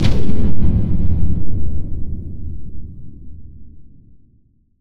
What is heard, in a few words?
Medium Cinematic Boom